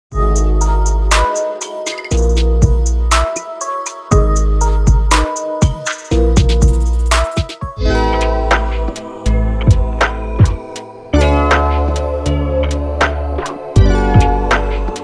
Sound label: Beats Bundle